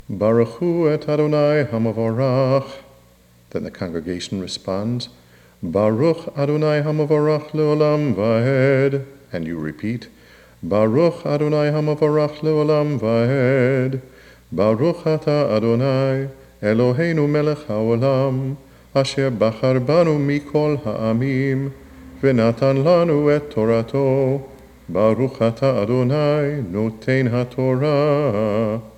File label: Blessing Before Torah Reading